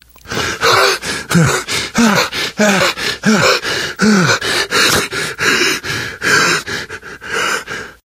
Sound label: exertion critical, survivor voice; source engine; left 4 dead 2; namvet